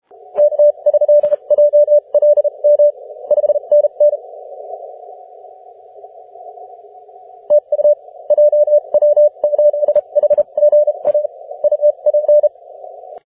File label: 20m cw